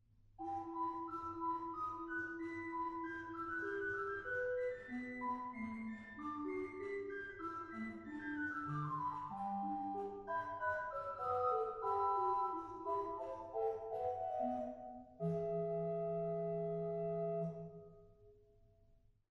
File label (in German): Rohrfloet 4, organ; stade